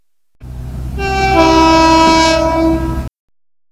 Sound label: slow tone